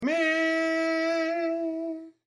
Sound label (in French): mi chant